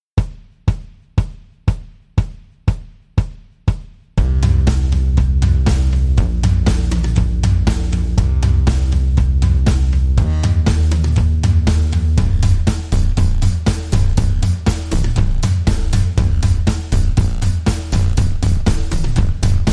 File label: Drum and Bass